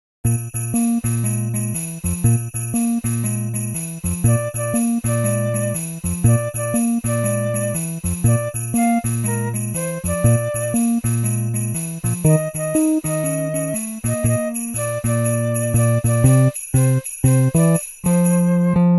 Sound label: Jingle Bells Jazz